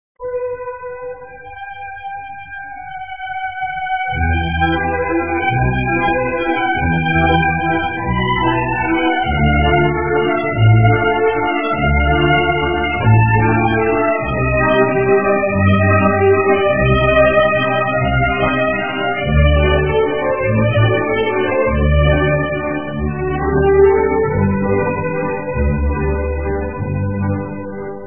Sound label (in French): Valse Romantique